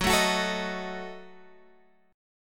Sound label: Gb Minor 6th, Guitar Open G; 16,14,16,14,16,x; down; chord shape